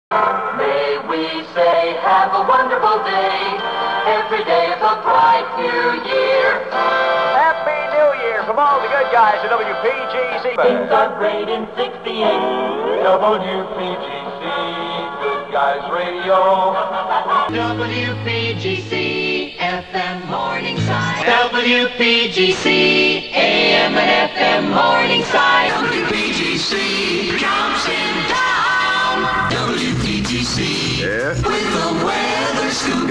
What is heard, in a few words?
jingles montage